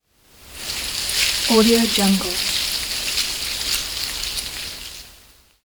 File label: foliage